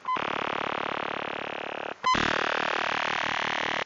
25520 signal ssb

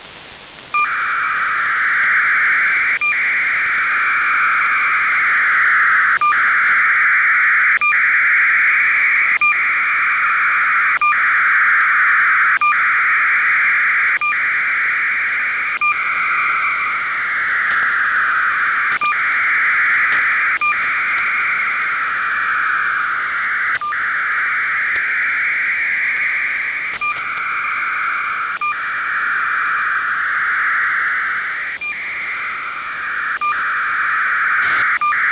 5421khz psk-4 1200bd

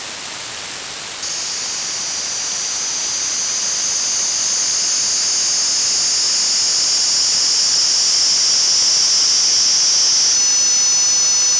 msk 2400 bps; tkey-96

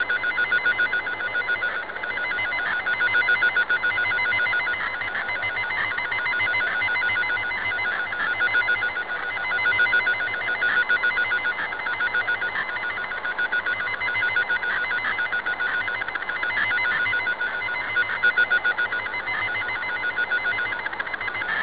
signal

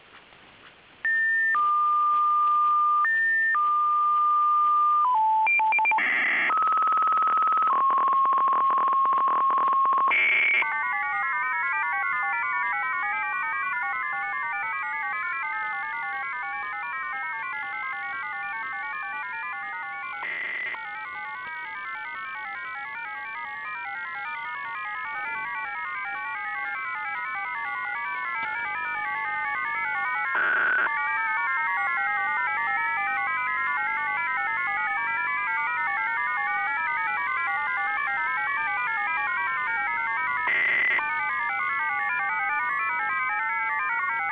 mfsk-16x5 psk-2; msk 13497 khz usb

